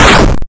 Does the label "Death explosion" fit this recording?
Yes